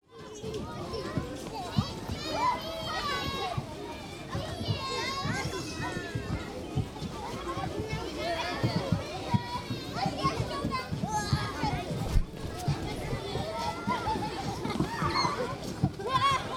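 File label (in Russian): звук игровой площадки дети играют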